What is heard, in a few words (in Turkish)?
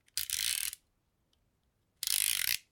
maket bicagi acma ve kapatma sesi